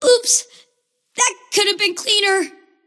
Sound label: lasthit pain; kidvoker; dota; invoker